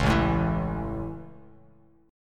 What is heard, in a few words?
A Major, Bass Standard; strummed